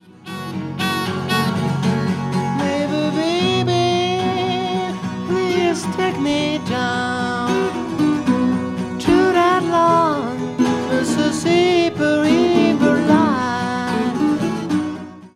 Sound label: Blues